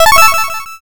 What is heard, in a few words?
start